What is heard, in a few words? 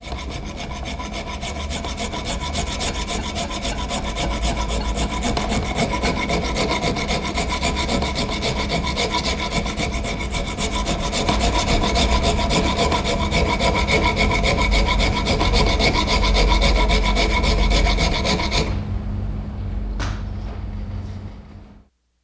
hammering various stone wood; Tony Schwartz Collection